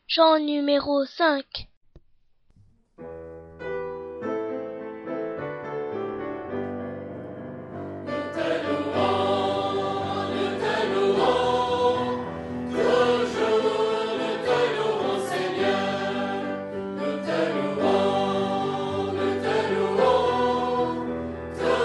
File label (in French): Chant; FR